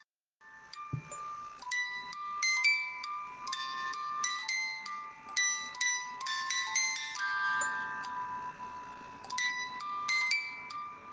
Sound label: schubert lullaby